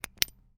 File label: On Off Click, Flashlight; zps